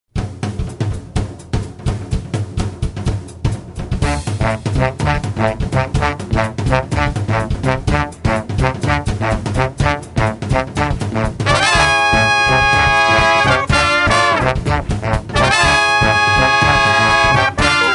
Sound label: big band